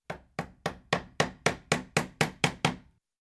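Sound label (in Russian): gvozd zabivajut v derevo, nail hammered into wood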